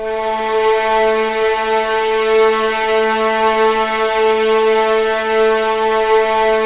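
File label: strings, violins; East West; The Ultimate String Collection; A3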